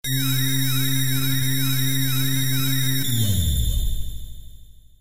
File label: wall change; ff3; ff3us